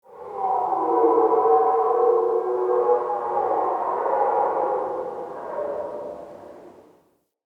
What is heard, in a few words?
Cave Ocean Animal Very Far